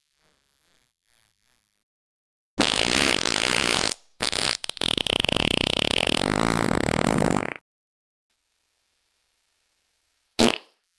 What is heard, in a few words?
fart sound effects wet; humor